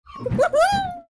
woohoohoo

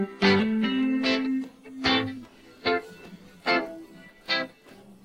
guitar

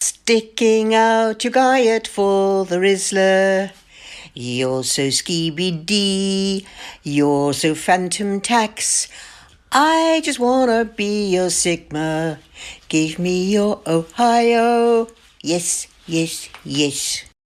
grandma singing

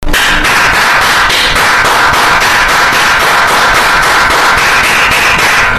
clap

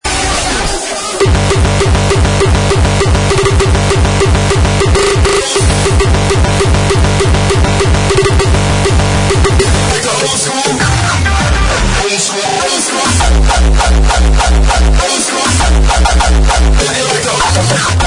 frenchcore oldschool

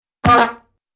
bonk

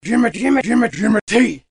jingle

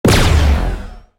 tick tock pistol shoot; pixel gun 3d

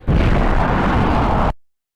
jumpscare sound; alone

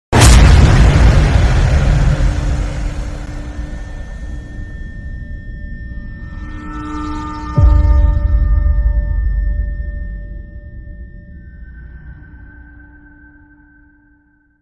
explosion

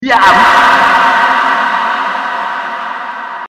noise

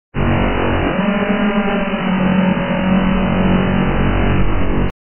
arrival doors

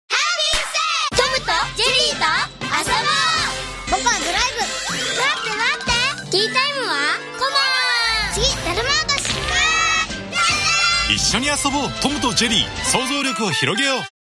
pian

